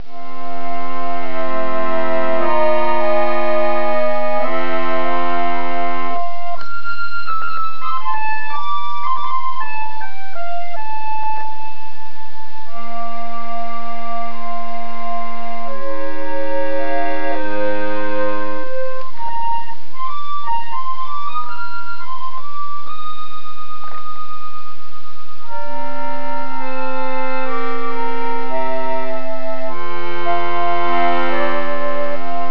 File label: harmonium; ak harmonium; kelert